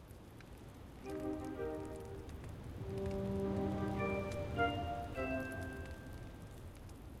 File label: feature Splash Loop; lost relics